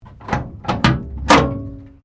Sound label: Train Diesel TGR X Class 1951 Tasmanian Cabin Door Open; Bellarine Tourist railway vehicles